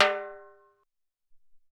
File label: Timbal Rim